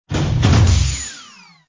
Pressure Release

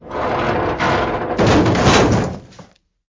Rending Crashing Metal

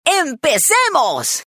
rock; rocktopus

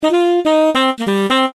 Sax Solo; rocktopus

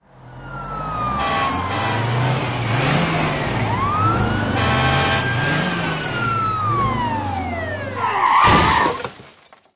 firetruck screech and crash

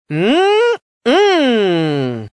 correct response; codeapillar

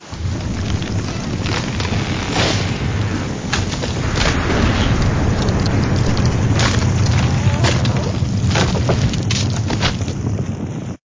Raging Lava with Falling Trees and Kaboom Short

